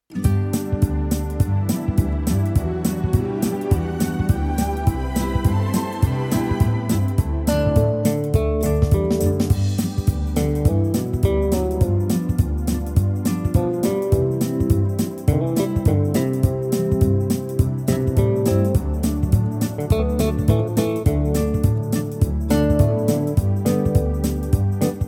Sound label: G country Live trak